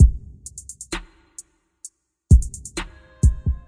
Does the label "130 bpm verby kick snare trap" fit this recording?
Yes